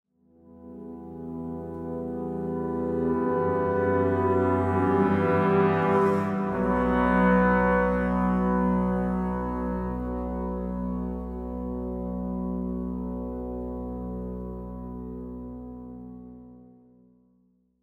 ambient, vulcanus

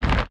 rock inventory move; Factorio

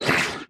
wriggler attack, enemies; Factorio

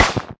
asteroid damage metallic medium; Factorio

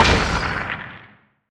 asteroid damage oxide medium; Factorio

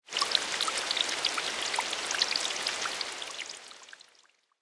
rain on water; factorio

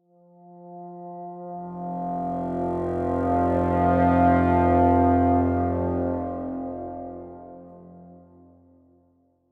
ambient; Factorio - Space Age; vulcanus; Vulcanus 9

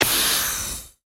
cp smoke mask puff, cryogenic plant; Factorio

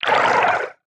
wriggler idle, enemies; Factorio

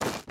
warm stone, walking; Factorio

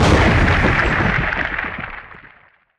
asteroid damage carbonic huge; Factorio

